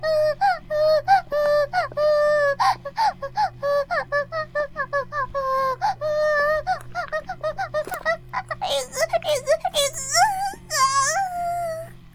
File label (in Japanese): バイブ放置; イクたびにわけて